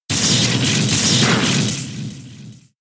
thunder shock

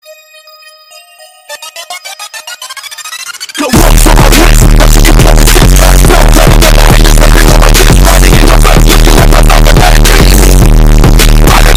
bass boosted

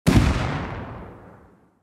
fake minicopter crash; rust

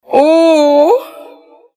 ooooouuuuu

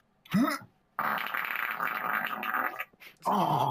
bubbles in the mud

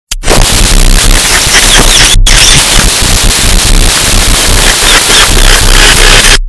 baby laughing too loud; meme

